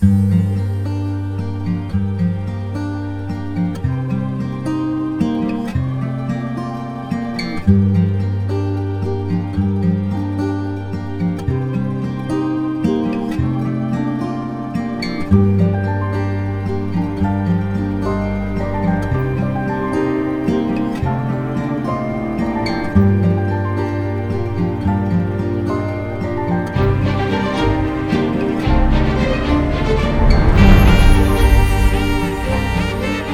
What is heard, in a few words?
Love BGM; Court